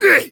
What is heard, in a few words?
male pain; firefight